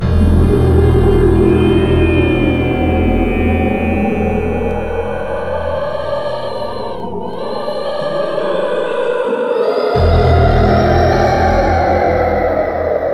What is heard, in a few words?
Sci Fi Horror Spectral Chorus Transition Soundscape